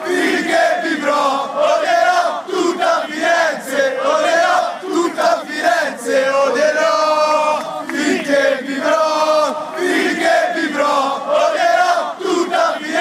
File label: best pisa chants